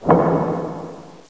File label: set peg; jewel